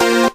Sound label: Synth Sound; Vengeance Samples; VEE Synth Shots